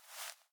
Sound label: equip; perdachcraft; minecraft